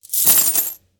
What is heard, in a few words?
coin drop, pickups; sfx; Dungeons Of Kharadum